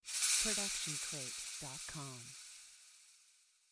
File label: rattle warning